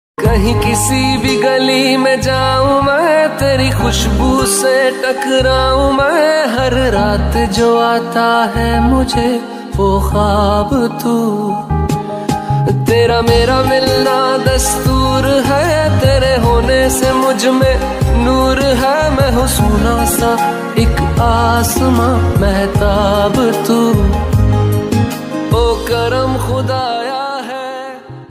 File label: Love Song Ringtone